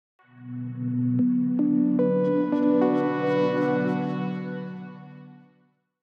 Corporate business logo intro

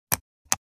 Logitech mouse click

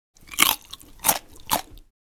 Eating and chewing a carrot

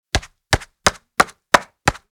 Monopoly board game token moving

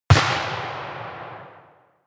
Shotgun fire distant perspective

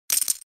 Chips falling small

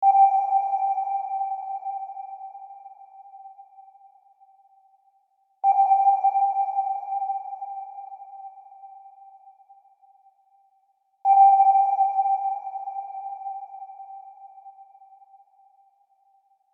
Submarine sonar pinging scan echo

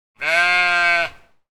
Sheep bleating

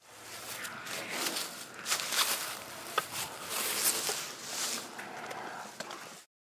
Calm wind through african elephant grass

